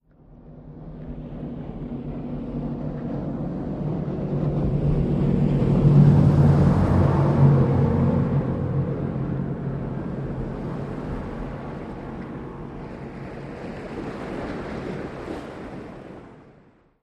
Military Pbr: By, Medium Speed, Medium-distant. Wake Hits Shore.